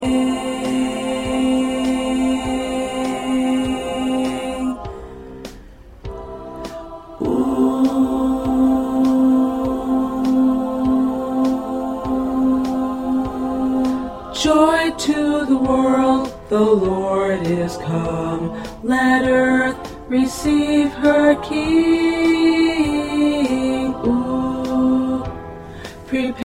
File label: 1st Tenor, choir; christmas songs for choir; part preview